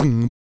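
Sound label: vox percussion, Vocal Planet Jazz FX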